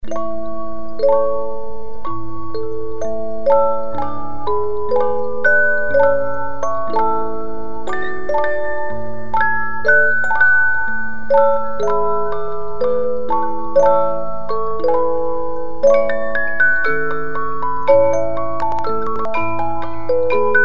Eine Kleine Nachtmusik Romance, music box 50-Note

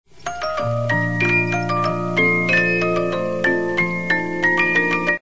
Glockenspiel, music boxes, 72-Note Movements; The Magic Flute

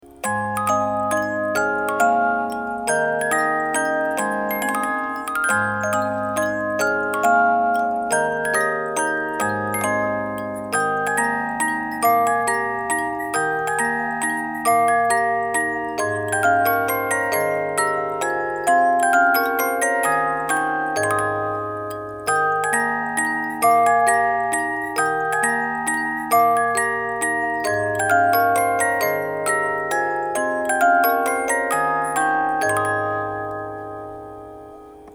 Bell-Like, Music Box; Gaudeamus Igitur

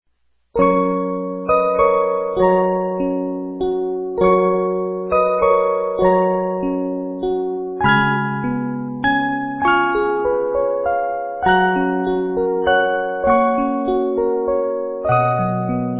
Silent Night, 50 Note music box

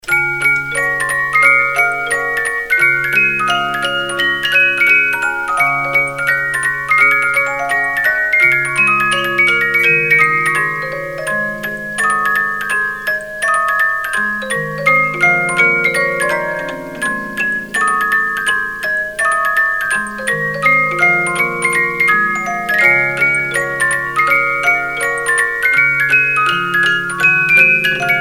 March of the Wooden Soldiers, 72-Note Movements

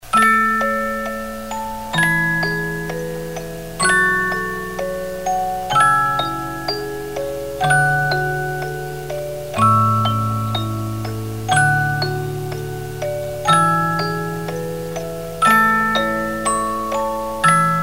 Canon in D, music box 50-Note

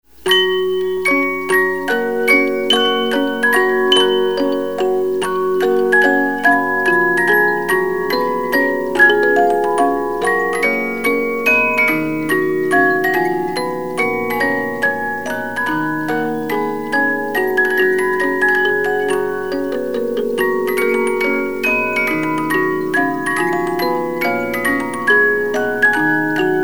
Watermusic Minuet, music box 72-Note

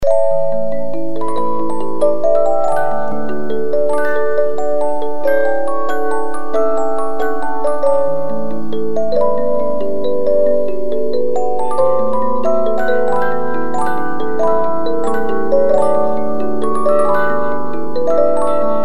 Impromptu, 72-Note Movements

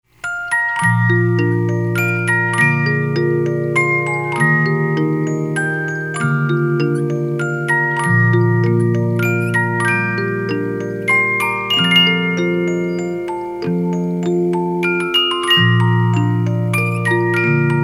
Amazing Grace, 50-Note Movements

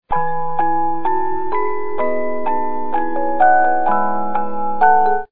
9th Symphony, 50 Note music box

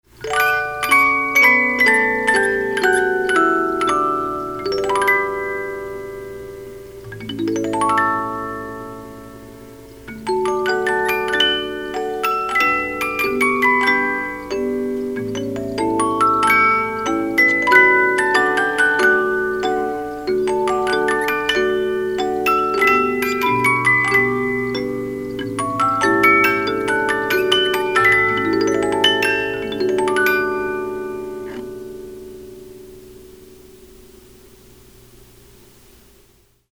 Maidens Prayer, 50-Note Movements

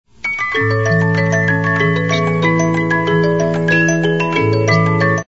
music boxes, 72-Note Movements; The Magic Flute

